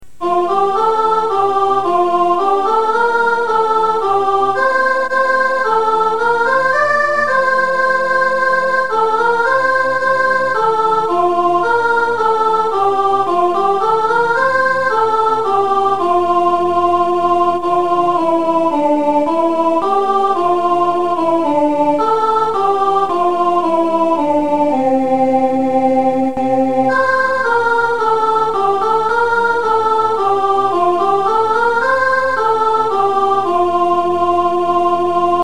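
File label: it came upon soprano, carol tracks; Christmas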